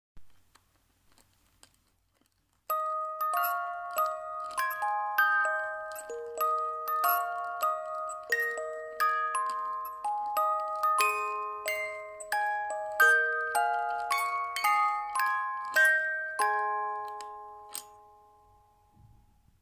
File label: happy birthday music box